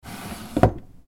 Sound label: Chest Drawer Close